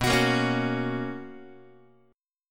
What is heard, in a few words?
B Major 7th Suspended 2nd Guitar, chord; BM7sus2; strummed; JGuitar